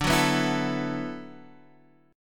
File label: D 6th Add 9th, Guitar DADGAD